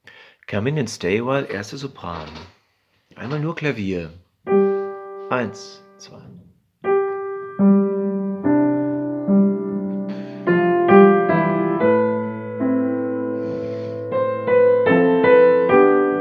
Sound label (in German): Sopran nur Klavier